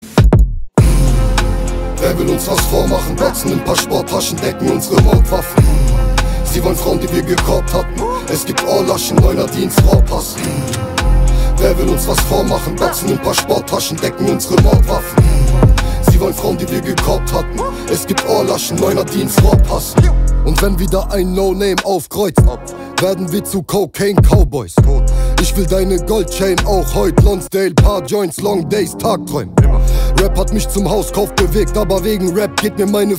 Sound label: mhhh; klingelton